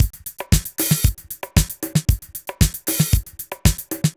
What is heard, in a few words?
disco